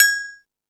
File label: Dance, Drum Machines